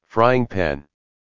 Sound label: Frying Pan